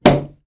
Champagne Cork Pop

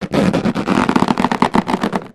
stretching growing

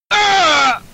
ahhh ahhh ahhh

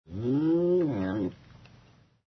ANIMAL CAT

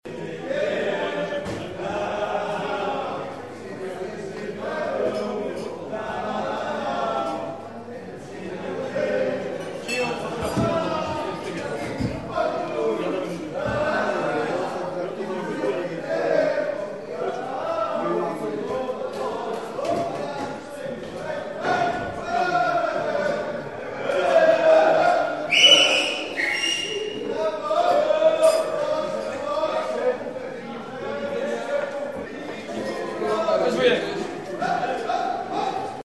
party ambience party ambience

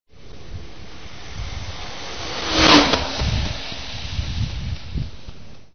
fast car

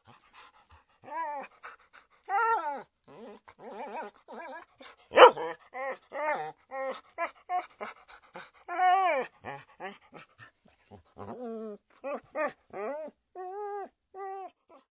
ANIMAL DOG MIXED

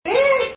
ehh sound

